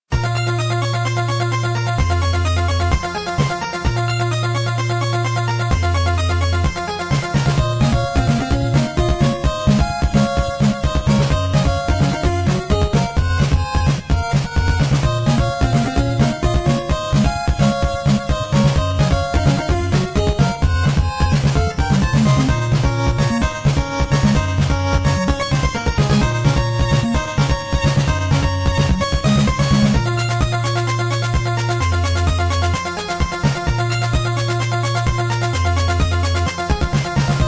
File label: boss battle; nes; castlevania ii simons quest; konami